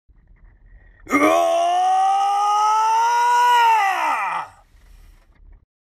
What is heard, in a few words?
voice uoooooooo